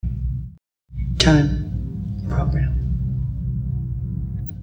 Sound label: UR FX vocalizer; Sonic Foundry (Sony Creative Software); Ugly Remnants VOL-2; UR 2 FX (Long)